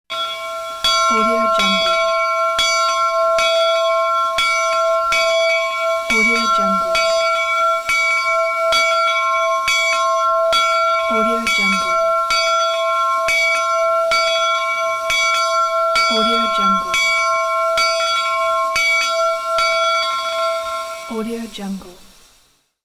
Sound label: steam train departure